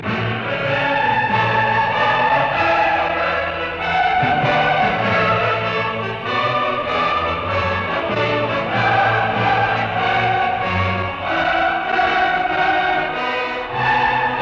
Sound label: Symphony in D minor Choral Finale Allegro energico sempre ben marcato; Symphony No 9; Op 125